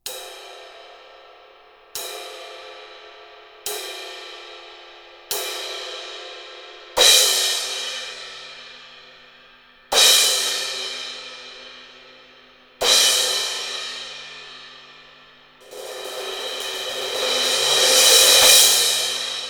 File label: 17in Raw Crash